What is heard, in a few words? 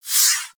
Fighter Rager Fire Whiz By; Grineer; Space Fighter Rager Agent